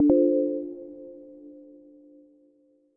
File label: double tap